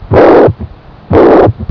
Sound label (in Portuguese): regur mitral sopro e terceiro som, sons cardiacos